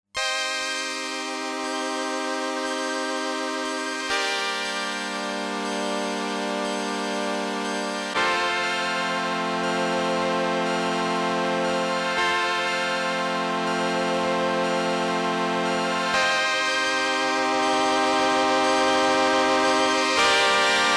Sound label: Torcido CT 101 Tube Warmth